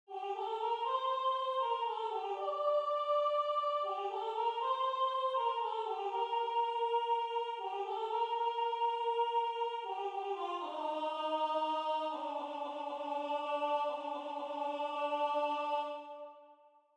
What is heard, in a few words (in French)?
La part Dieu Alto; La Part à Dieu - Lallement